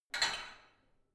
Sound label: barbell only lift up, fitnessstudio; sport freizeit